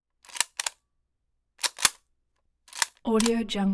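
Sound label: Tikka lite bolt action load shell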